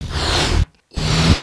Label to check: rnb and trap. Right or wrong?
Wrong